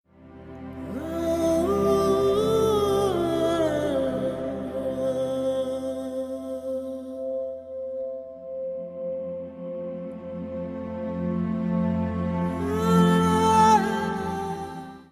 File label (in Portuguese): musica cortada; Som da animação